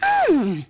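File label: female yum; People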